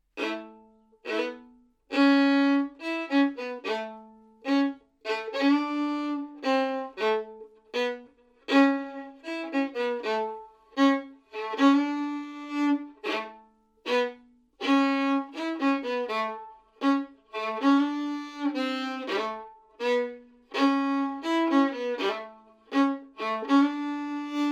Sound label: riff slow; Akkar